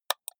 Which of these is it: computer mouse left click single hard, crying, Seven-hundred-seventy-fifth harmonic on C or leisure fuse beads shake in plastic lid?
computer mouse left click single hard